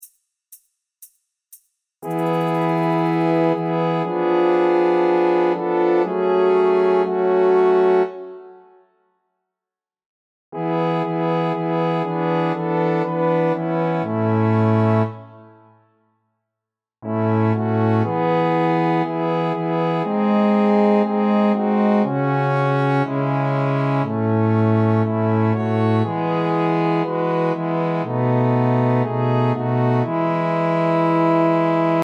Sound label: Karaoke; Hau Kotoa; hau katoa a e fie hau